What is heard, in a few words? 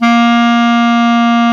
CLAR, Clar Jazz; clarinet; Roland L-CDX-03 Disk 1; Clarinets